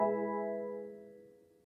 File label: bmw fault chime; 2023-2025